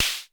KLONE NOIZ, Klone Dual-Percussion-Synthesiser, HHAT; Drum Machines